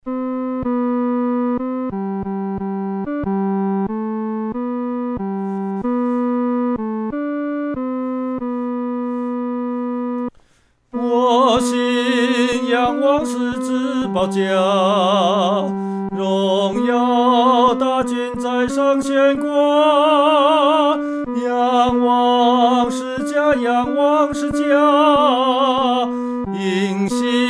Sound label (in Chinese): 独唱 第三声